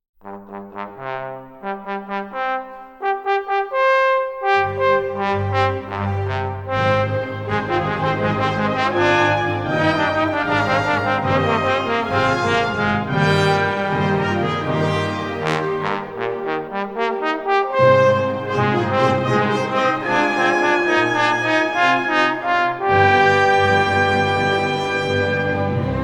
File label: Concerto For Trombone and Orchestra on Albanian Folk Melodies